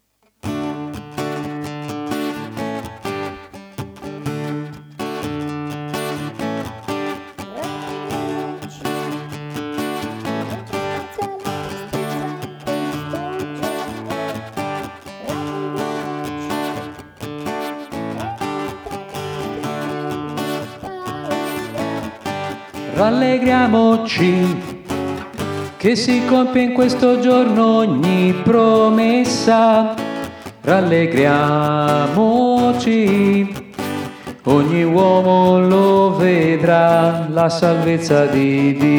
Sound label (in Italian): rallegriamoci uomini